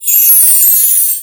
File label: ling